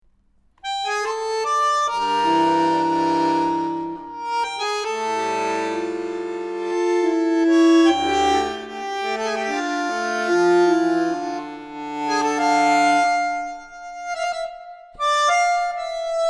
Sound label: Tango Begins in Darkness